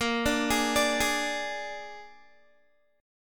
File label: A sharp 7th A sharp, Guitar; A#7; arpeggiated